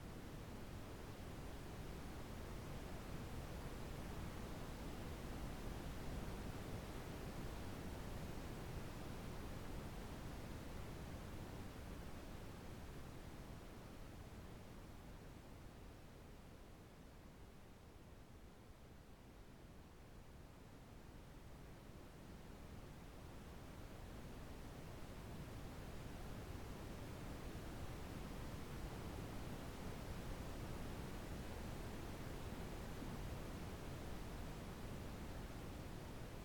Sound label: oscilating brown noise